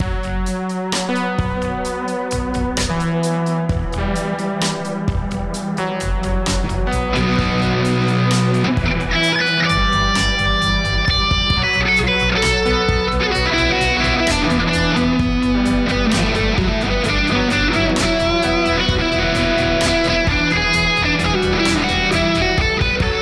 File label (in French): slow life like jam wind guitare rock instrumentale